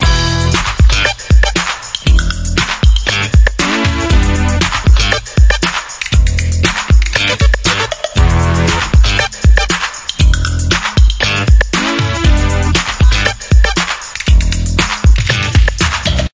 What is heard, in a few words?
random loop